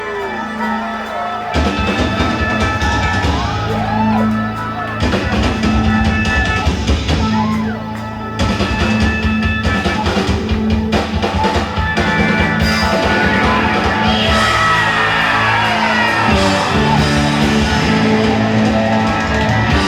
Drum Solo; Tramps New York; 30 January 1996; Tramps NY

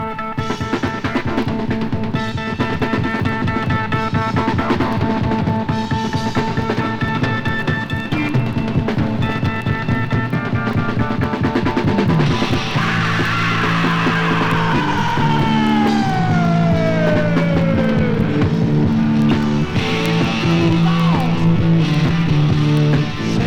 Drum Solo; Philadelphia, PA; December 4, 1973

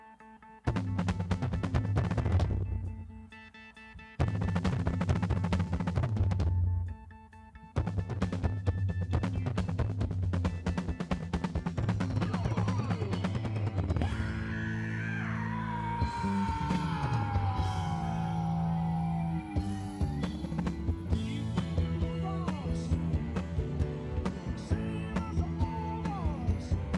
Drum Solo; Live In Mansfield MA 2000 - Remixed And Remastered

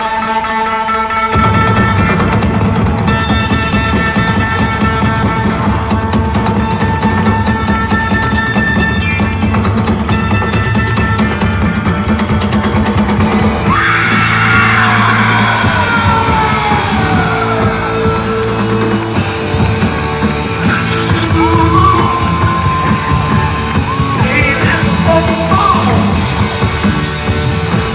Drum Solo

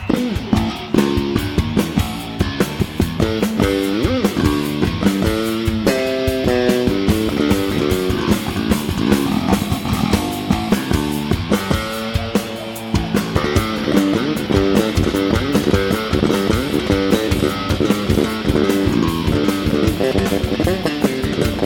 Bass Solo

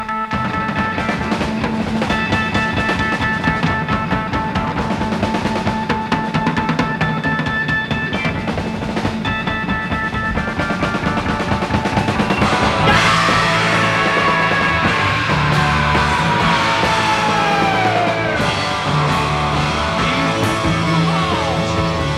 Drum Solo; Swansea; June 12, 1976

